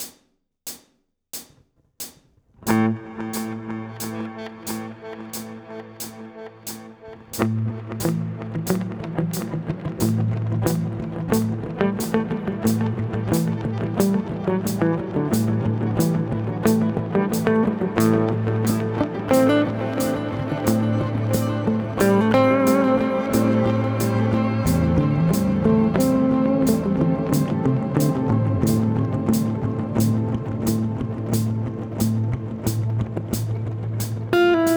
Coole Rhythmen mit Delay 90 BPM Guitar Rig 7 Deep Grain

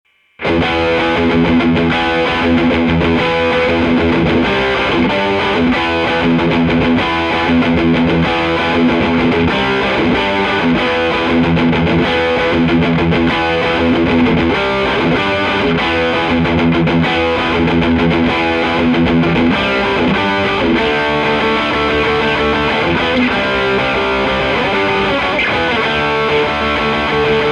universal audio bock 187 e gitarre normal

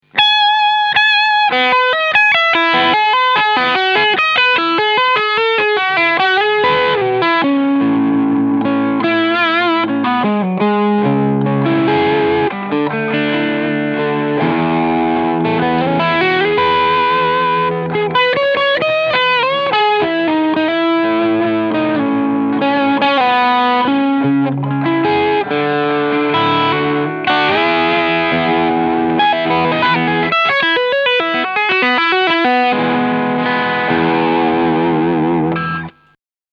Keeley Noble Screamer Overdrive beide Schalter unten Tone 9 und Drive 3 Uhr

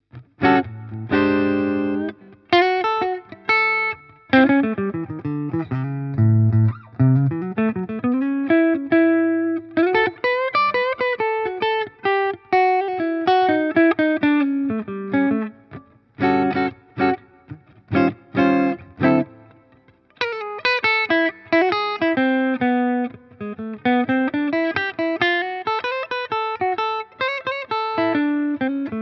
Harley Benton SC Custom III Active Hals clean

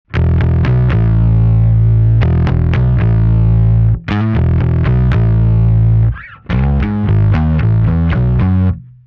Bass Zerre; Quad Cortex

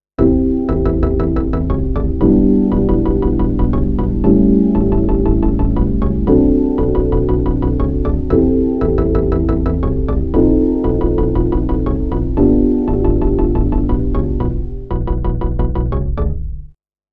KI MIDI Generatoren Staccato A Major Chord Progression Melody

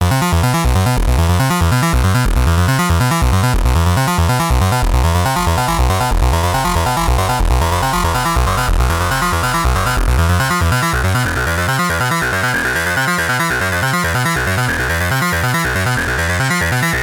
Sequenz mit Audio Feedback Patch; Behringer K-2 MK 2

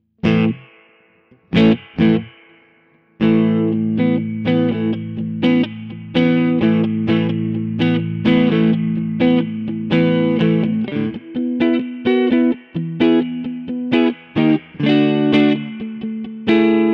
Crazy Tube Circuits White Whale Effektgeraet Rev Medium Mix Dwell 3 Uhr Tone 11 Uhr

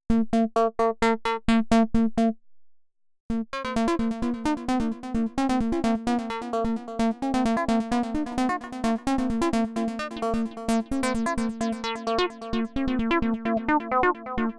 Digitakt Slices Funktion als Synthesizerklau

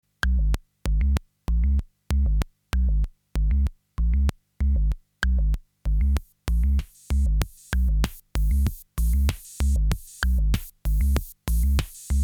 Soma Pulsar 23 Patch Melodie LFO OSC

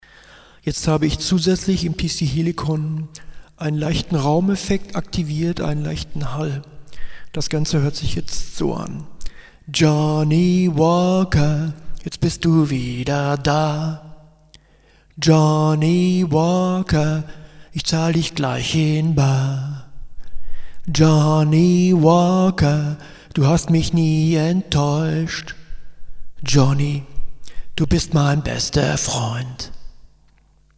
Akustik Duo; selbst abmischen

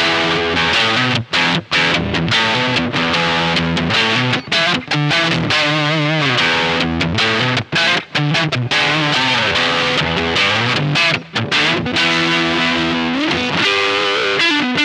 Electro Harmonix Lizard Queen Mittelstellung Neck Pickup

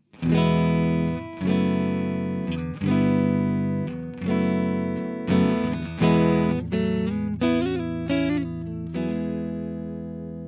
Teletronix LA-2A Regler 12 Uhr Mix 100; UAFX

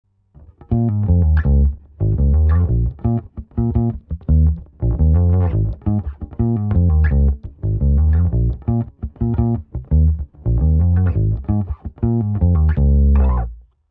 Quad Cortex Bass clean mit Comp und Mesa Boogie

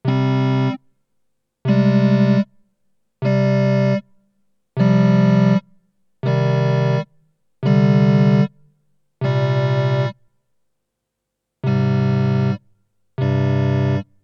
Mooer Harmony X2 Synth durch die oberen Intervalle geschaltet

